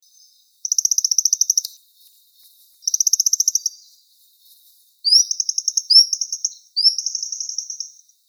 Plumbeous Water Redstart Phoenicurus fuliginosus, Ladakh